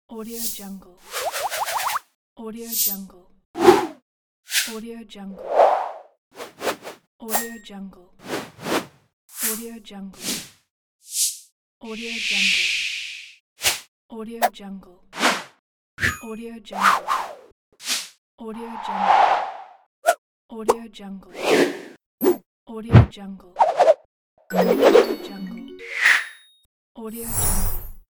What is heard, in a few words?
swooshes